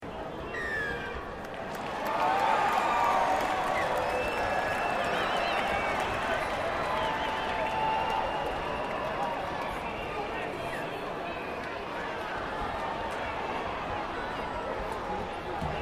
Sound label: Ambience Worldcup Rugby Crowd Cheer Loud; World cup rugby match between New Zealand and Papua New Guinea; Sports Sound Effects; Foundation1